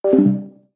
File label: leave, discord